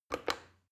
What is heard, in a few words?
plastic button start click regular fast